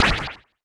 Oneshot; Sonic Foundry (Sony Creative Software); Esoterik Beatz; Warsaw

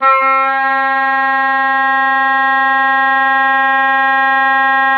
ROLL C4, OBOE3; Xsample VOL-6

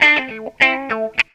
GTR, GTR RIFFS, Funky Elements; Zero-G

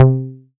BLEEP C3; Best Service Dream Experience; SYN-PIZZ

